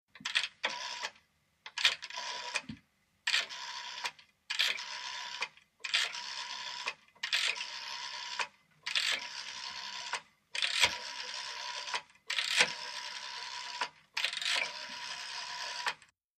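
British Standard Call Box Dial, pulse rotary dialing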